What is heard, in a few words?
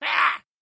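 goblin sound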